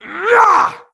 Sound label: Horseman Attack; svencoop; keenhalloween; horsemen